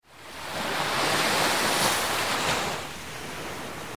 Wave Enter; fishing3

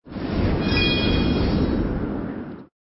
wind

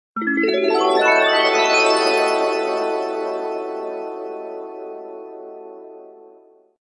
open; fish

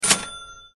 recycle, countsound; fishing3

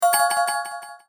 Combo

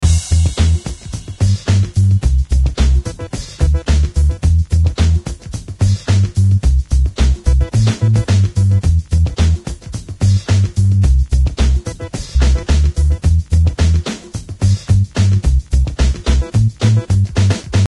backmusic

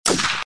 gun, gunfire; fishing3

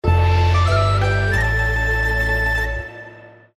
We Win; paoyao

